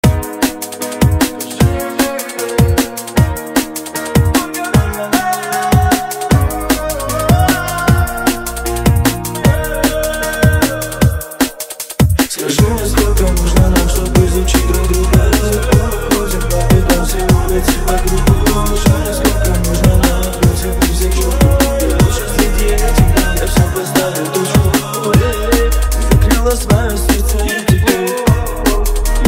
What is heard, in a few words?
drum and bass beats remix